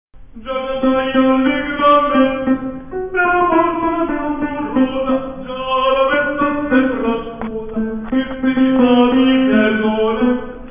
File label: Gia cantai Tenors